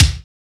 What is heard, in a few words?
SHARP KICK; Drums In Your FACE!